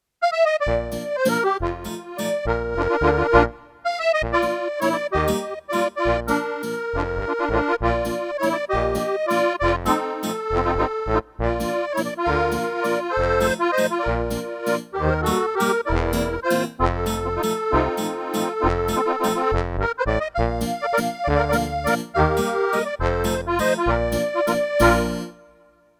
Waltz

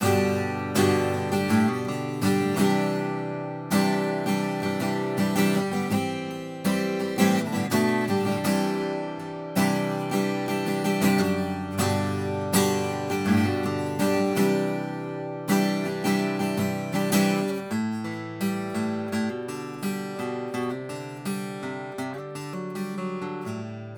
Rode NT5

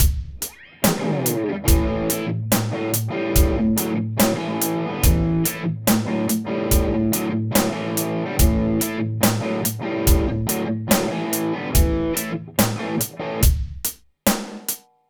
el84 pedal; tad class a converter; originalroehren 5881

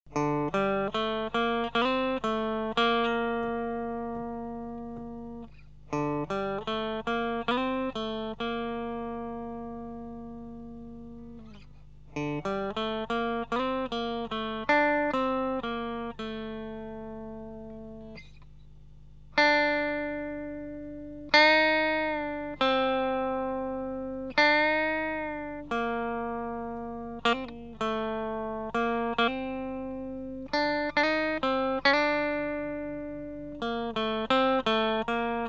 M1000, LTD M1000, Gitarren

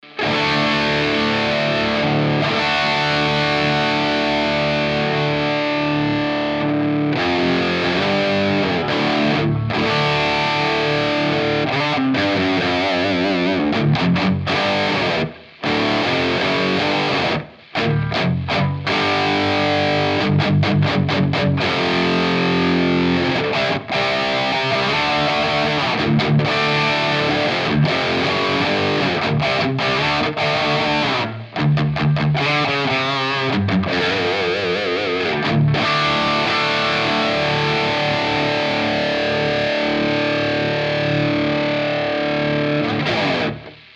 jvm, marshall jvm

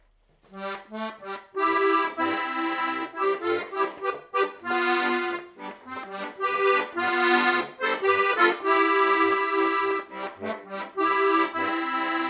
polka, steirische harmonika

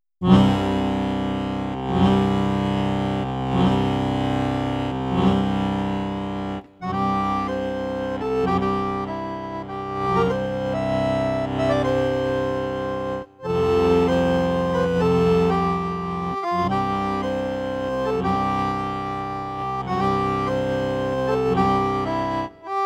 bagpipe; ratespiel

